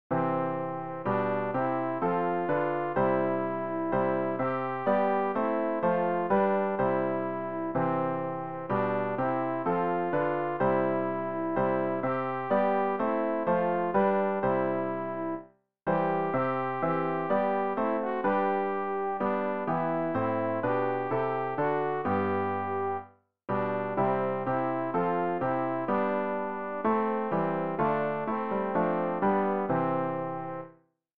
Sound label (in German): alt, Übehilfen für das Erlernen von Liedern; wie soll ich dich empfangen